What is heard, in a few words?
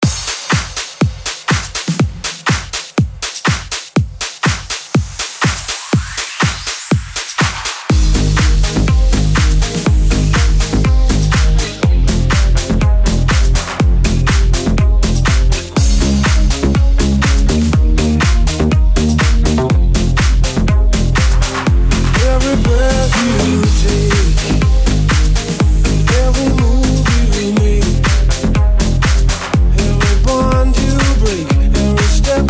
future club redrum 122 bpm